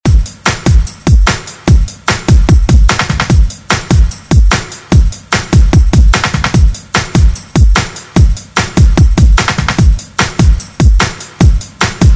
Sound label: 60s Ext Redrum